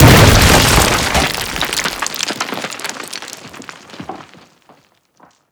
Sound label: explosion detpack cement; Explosions; Call of Duty Modern Warfare